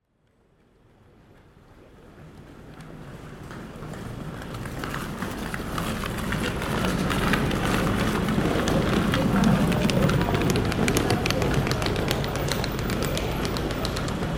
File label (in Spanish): Exterior Estacion Tren